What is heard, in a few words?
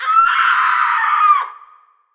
Female Scream